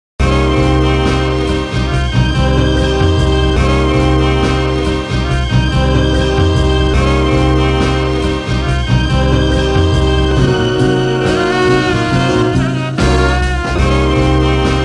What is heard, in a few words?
Instrumental